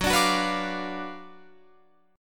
F sharp Major 7th Suspended 4th Sharp 5th, Guitar, Open F; F#M7sus4#5 strummed; 18,21,18,18,20,21; chord shape